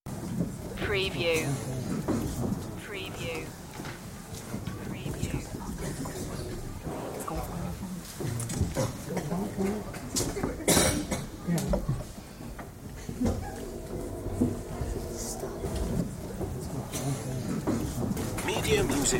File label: Audience Chatter Crowd Ambience; beanstalk audio